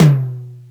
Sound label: high tome drum sample d key